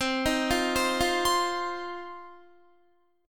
C Minor Double Flat 5th C down Guitar 4F 4A 5C 5G 5C 6E; Cmbb5; arpeggiated; JGuitar